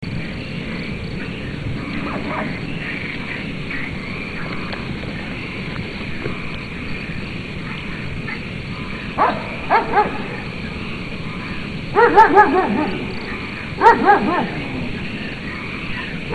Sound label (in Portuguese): husky, 5 anos; preso